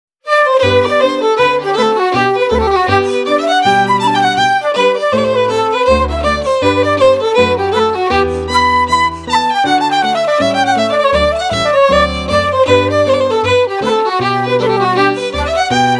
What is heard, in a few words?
Set Jigs